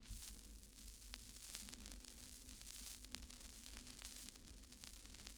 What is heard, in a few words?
Vinyl Crackles